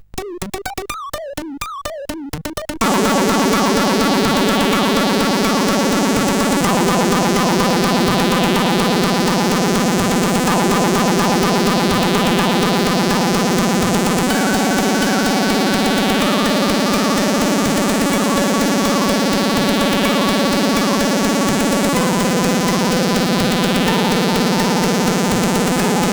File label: Intro Tune, sid CSG8580R5